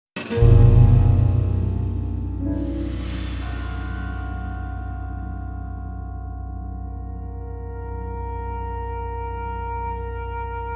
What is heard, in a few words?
Tension